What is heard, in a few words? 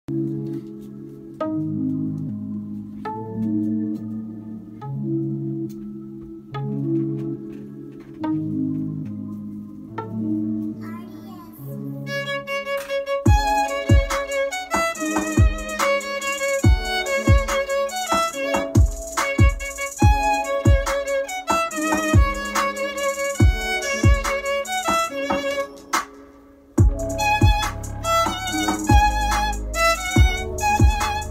version violon